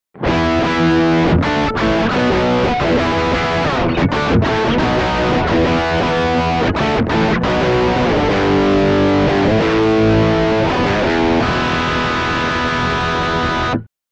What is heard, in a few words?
Bridge Drive, sabotage